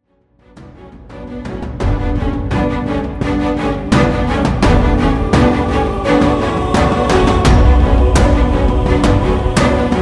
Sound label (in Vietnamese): Dan nhac su thi hap dan mo dau phim hoi hop va nhac phim truyen hinh